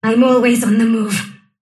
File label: sylph move pain; dota; dark willow